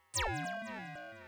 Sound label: alien bullet; 2d arcade game design